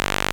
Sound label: JX PULSE; E-MU Producer Series Vol. 2 – More Studio Essentials